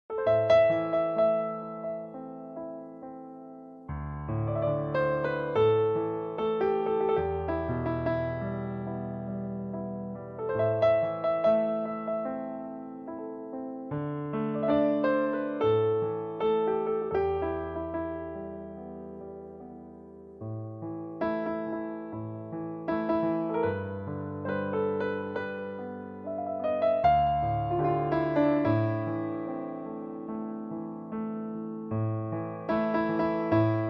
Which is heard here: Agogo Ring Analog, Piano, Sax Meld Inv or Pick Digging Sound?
Piano